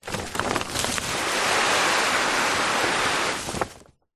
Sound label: household large green waste garden bag full plants foliage slide ground; zapsplat